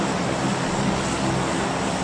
Transport aircraft

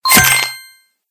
victory

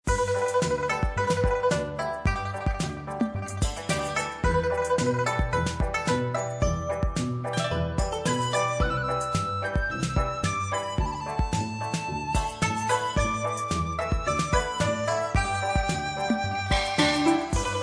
room bg

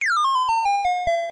slot slowdown

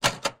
result lose

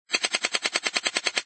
shuffle card

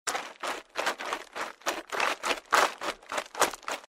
Running

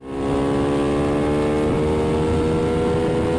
moto run

